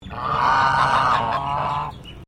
BIRD GEESE CHINESE HONK; Birds from Collingwood Children's Farm; Animals

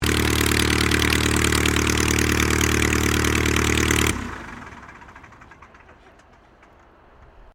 AIRCRAFT AUSTER III SHUTDOWN STEREO; Airplanes; Vehicles

